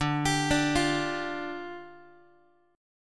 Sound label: D Minor Double Flat 5th, down, Guitar